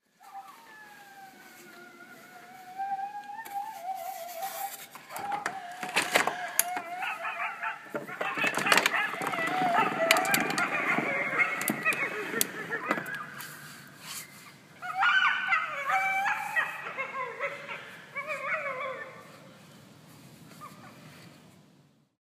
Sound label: Coyotes